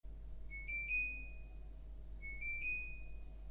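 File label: end alarm, washing machine; زنگ تمام شدن ماشین لباس شویی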